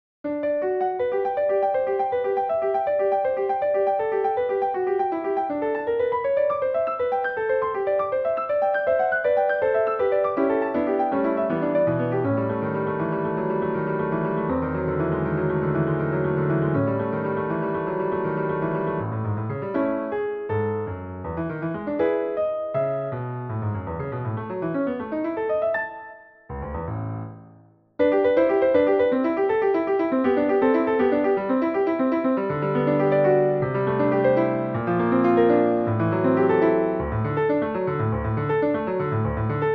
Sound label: solo piano; five incidentals